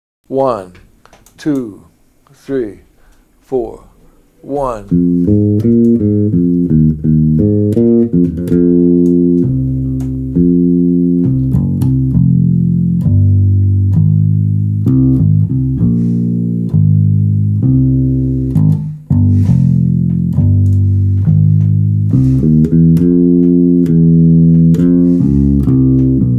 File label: bass displaced 8th note triplets into Fm blues; jazz; Mike Longo